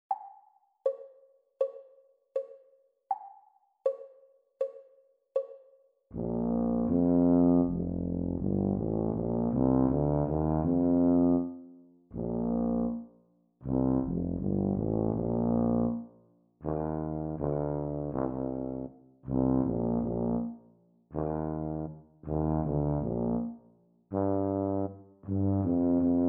Bb tuba duet practice speed; Grade 4

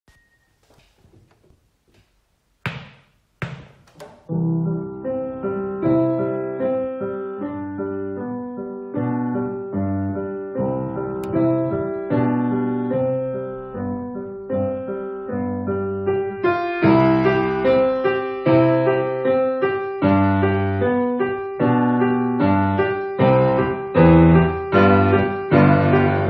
Piano Duet practice speed; Grade 5; Diabelli; The Teacher part

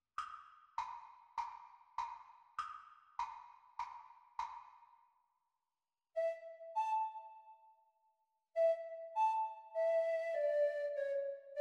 Treble Recorder Performance speed; Grade 1; exam speed

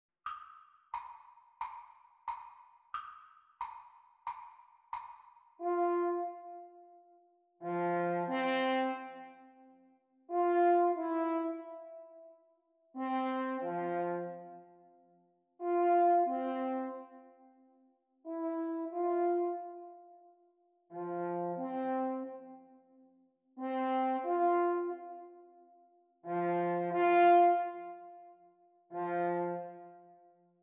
French Horn Duets Playalong

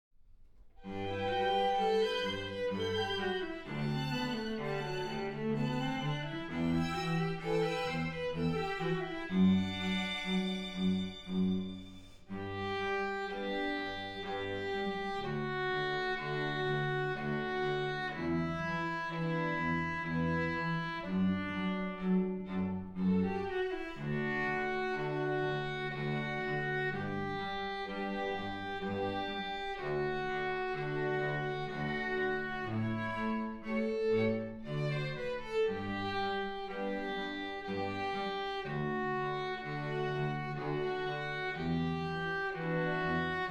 with violin

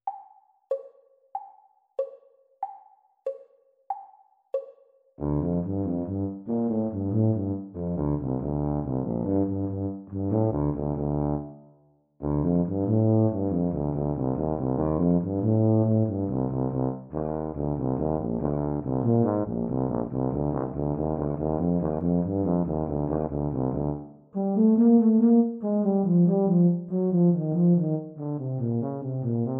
Eb tuba duet reel exam speed; Grade 7

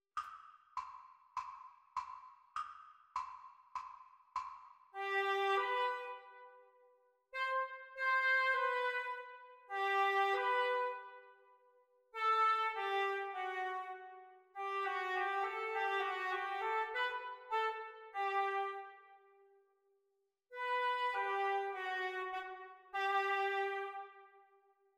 Oboe Duets

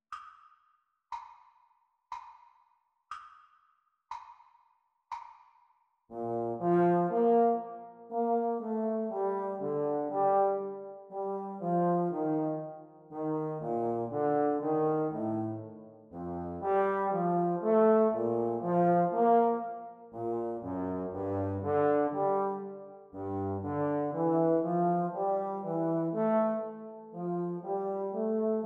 Playalong for Baritone Euphonium Practice Speed

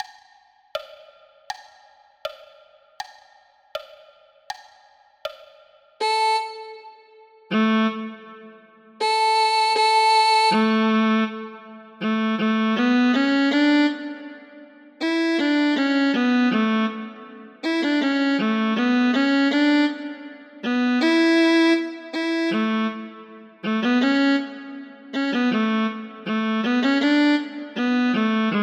Violin Duet Practice Speed

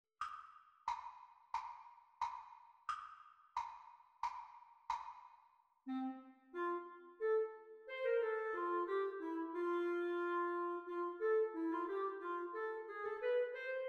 practice recording clarinet duets; Grade 5

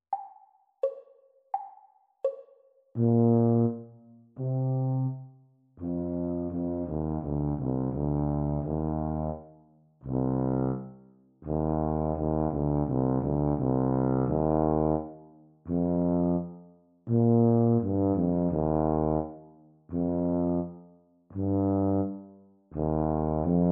Eb Tuba Duet; Grade 2; exam speed

